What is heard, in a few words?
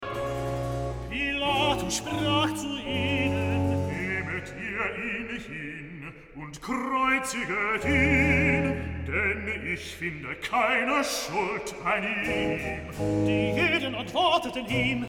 Recitative; Bach, J S; Johannes-Passion, BWV 245, Pt. 2; XVII. Recitative. Pilatus sprach zu ihnen